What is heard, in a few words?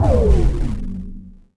Force Field end; naboo; Star Wars Obi-Wan; XBOX